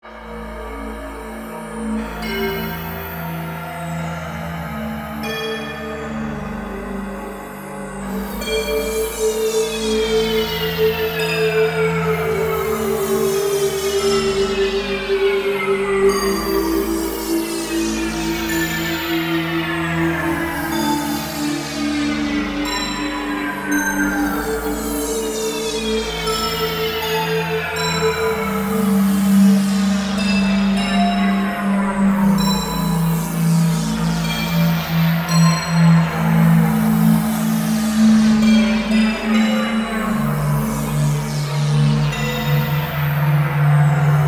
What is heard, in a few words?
ambient; Lephisto